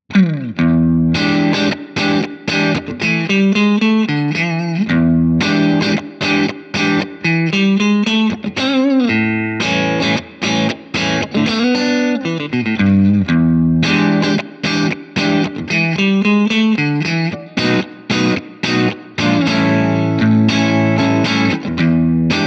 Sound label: breakup; jensentone